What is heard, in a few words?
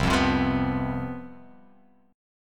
C Major 7th Suspended 4th